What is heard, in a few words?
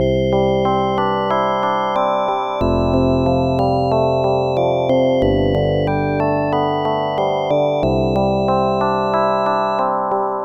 ballad; AdLib music synthesizer card demo songs; IBM PC XT AT